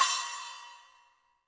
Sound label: HiHat & Crash, Roland TD-20; HiHats